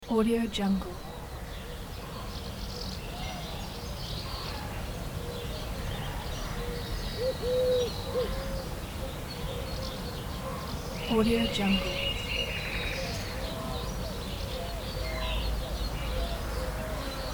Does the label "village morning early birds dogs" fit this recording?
Yes